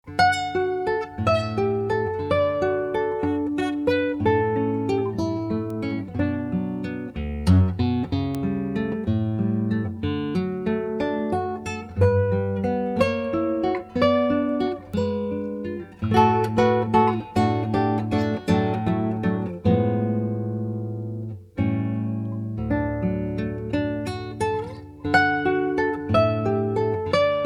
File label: Classical Guitar, Prelude in D; Tarrega